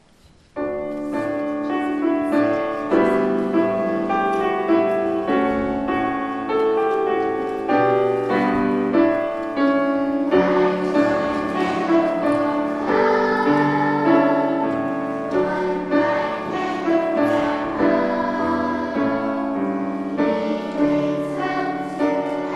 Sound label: Children's Choir